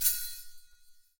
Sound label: METAL; ILIO; Ethno Techno; BEDROCK 126